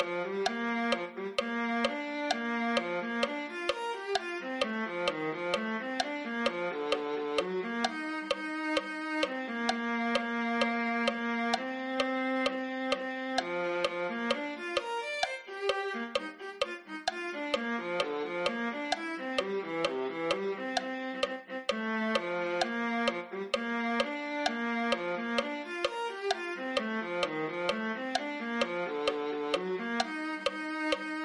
viola

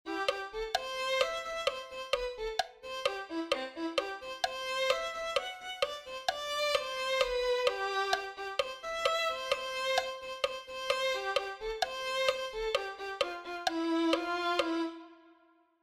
violin

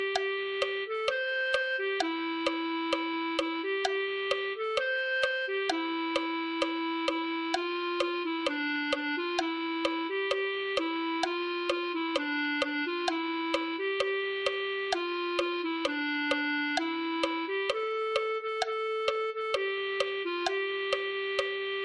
clarinet

